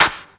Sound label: CLAP HOU, Dance Mega Drums; Best Service